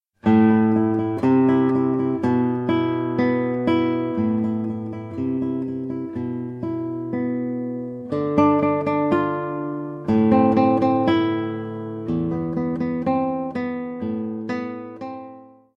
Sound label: ritmico, guitar; biederman